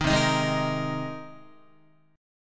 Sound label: C Minor 7th Sharp 5th C Guitar